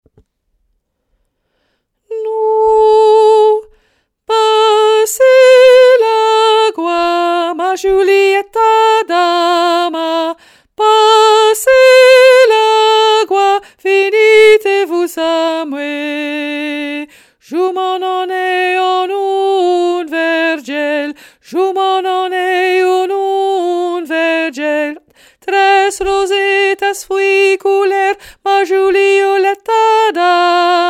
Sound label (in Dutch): sopraan